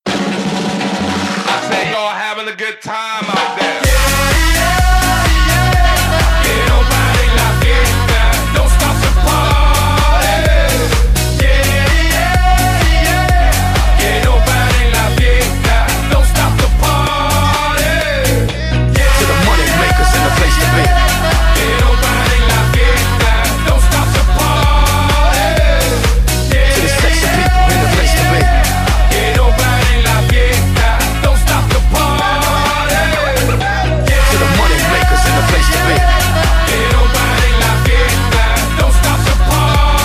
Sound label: Goal Song